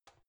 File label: cupboard open